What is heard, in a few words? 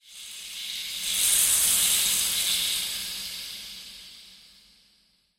ambient maraca; evo maraca; wood